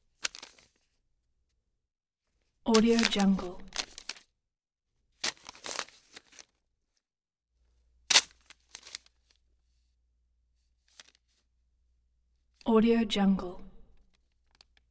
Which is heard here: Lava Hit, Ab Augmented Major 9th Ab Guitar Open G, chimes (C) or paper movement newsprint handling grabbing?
paper movement newsprint handling grabbing